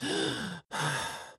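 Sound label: Realistic Fatigue Voice, male; oblivion; Imperial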